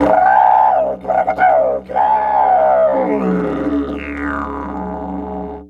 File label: WILD, Didgeridoo; Big Fish Audio - Didgeridoo and Other Primitive Instruments